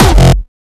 kick oneshot lilmonster F; GhostHack

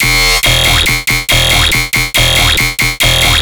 Bass Loop E 140BPM, Dubstep; Moonbows Bass; Ghosthack - AC2024 - Day 13 - Dubstep Sounds 2025

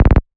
Misc Bass A

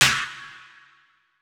Snare, Dubstep Snares; GhostHack

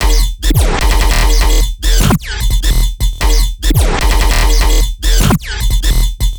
Bass Loop E, Dubstep, 150bpm; Synthgroove; Ghosthack; Dubstep Sound Arsenal

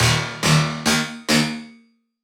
Riddim Melodic Hit - D# Scraper; One-Shots; Ghosthack; Riddim Essentials Volume 2

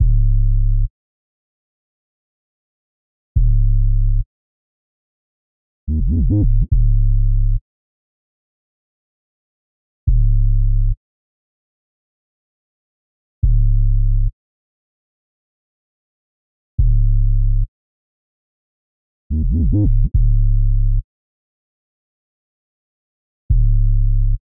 143 BPM C# Min 808, Drill; Cymatics; Nothing Left

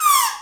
blade of grass; Cartoon - film effects

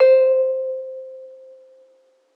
PLUCK Soft Metal - C; Synth One Shots

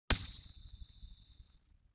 LoFi Metal Chime Hit, Vintage Cartoon

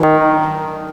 pipe organ fx

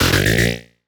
Retro FX; Cymatics; Video Game Sample Pack Vol 1

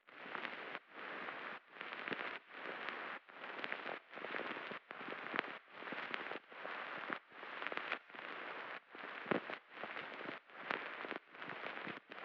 75 BPM G Min Vinyl; Melody Loops; Pistachio; Cymatics; ANALOG EVOLUTION Beta; Lofi Collection

